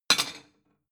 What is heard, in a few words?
plate small shelve slide hard